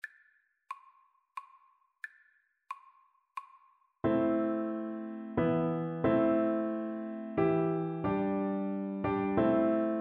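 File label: recorder karaoke; coventry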